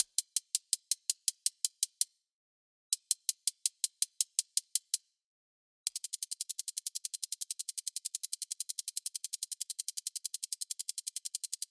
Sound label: Hihat; Trap-Beat